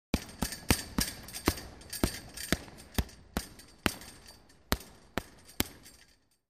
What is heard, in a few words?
Boxing Punching